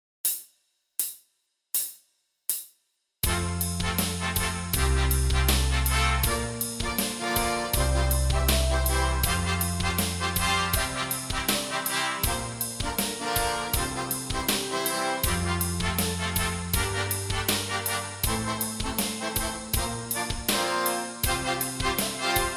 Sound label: Concert F Scale with Thirds